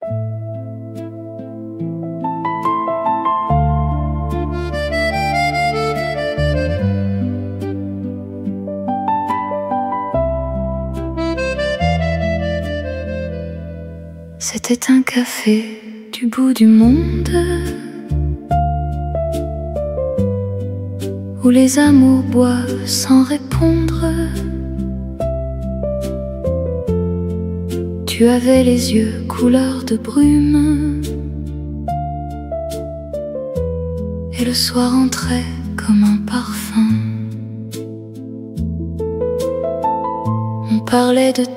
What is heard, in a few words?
Emotional French Song - Paris Night Ambience